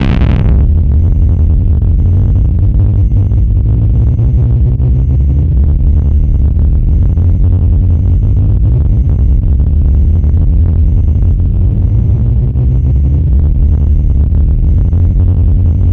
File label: SUB WAVE, Special FX Twisted